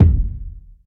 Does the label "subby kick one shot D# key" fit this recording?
Yes